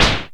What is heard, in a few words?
ANASNARE; Back In Time Records; Big Bang; SNARES